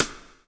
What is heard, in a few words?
BLUES TK, Backbeat; Spectrasonics - Backbeat